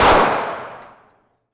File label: Hand Gun Shot and Shell; Nova Sound